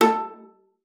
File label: A4, VIOLA FINGER; Xsample VOL-5